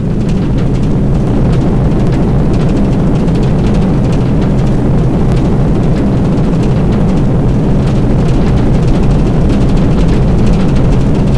door open

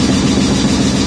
loop air pump

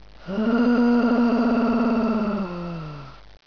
mummy idle

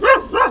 alert; hungerhound

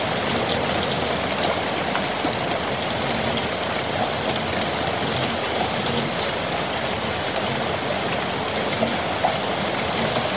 rain; haus

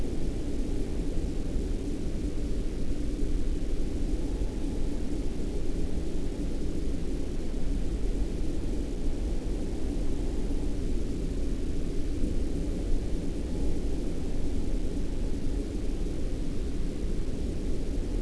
rain dull; de shooting

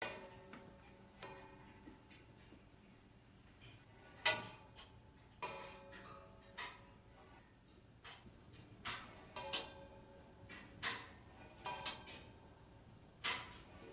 pipes echo

